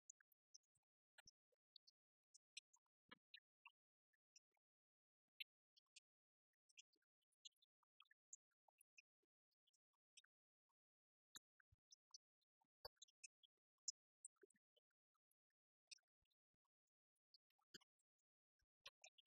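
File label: popcorn popping while frying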